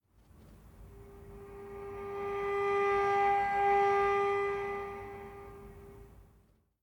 Savannah Music

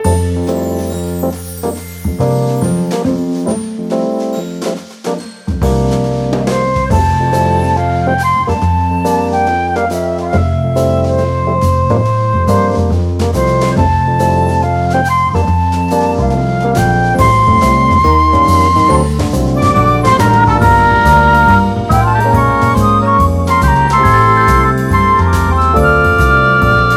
Music Event

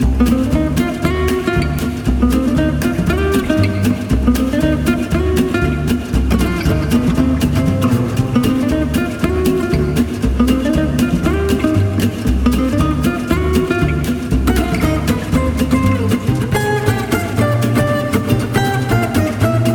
Gypsy Jazz Grooving; Red Game Without A Great Name

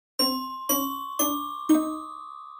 Xp Gain; brawlout

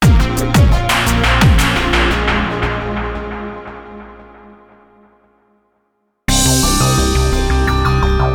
DramaticEvent

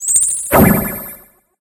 Boss gift Spawn; Candies n' Curses

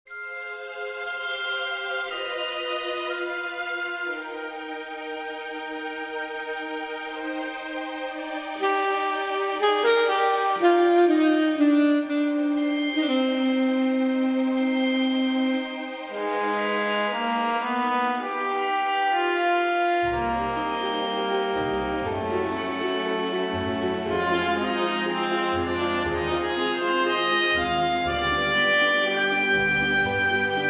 The Beautiful Sea (No Vocals)

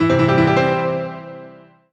Solo Piano Positive; MUSIC EFFECT; startide

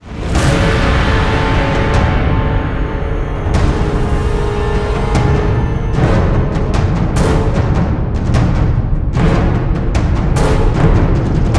Combat Long

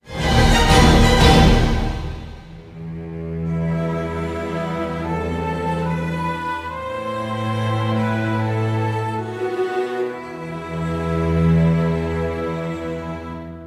jingle escape; emily; Haunted

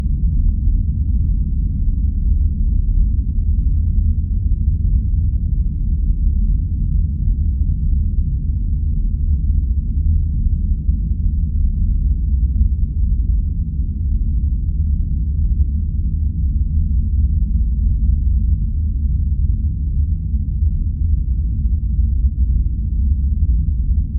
deep space rumble loop; Spaces; Sexbot

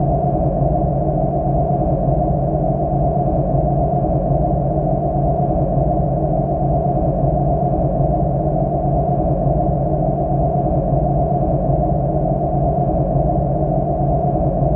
Atmos B Loop; Lord Monotone; Laser Disco Defenders; ps4

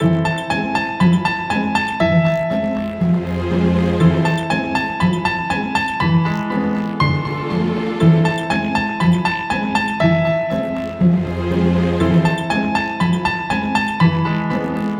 Horror; Hitozuma Elf no Orusuban

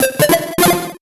Solo Chip Arp Positive; startide macos switch windows gamerip 2017

